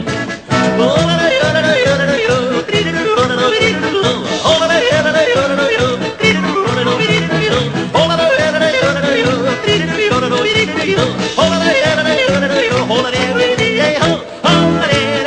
yodel cool

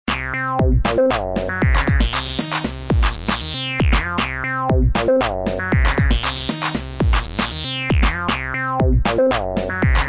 Bass

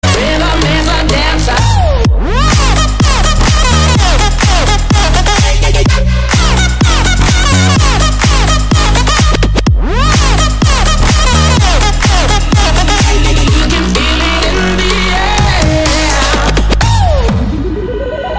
Techno Rhythm

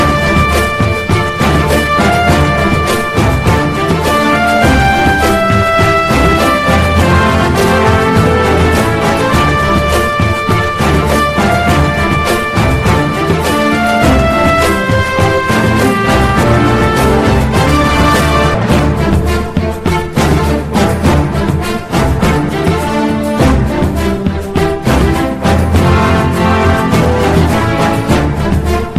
Epic; OnePiece

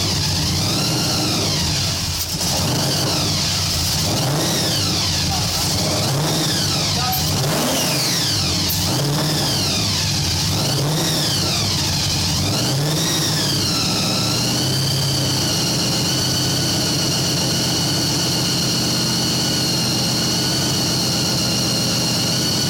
blown vk

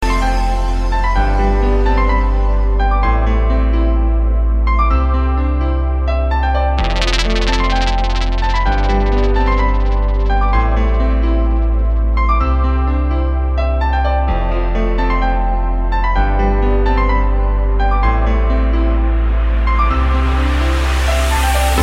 chill out

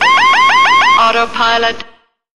AP disconnect MD11

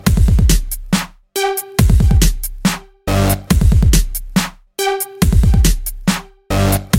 EDM Sound

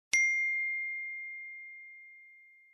iphone cool tone

